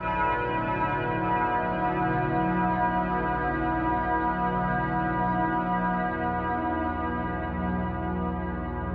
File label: keys, Loops Sad